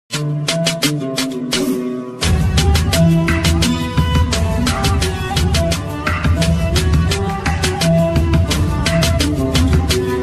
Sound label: attitude ringtones bgm ringtones english ringtone danger ringtones